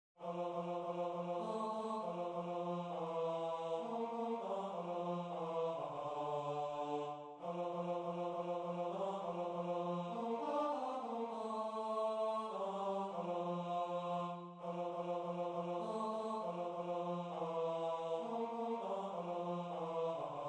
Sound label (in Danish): Tenor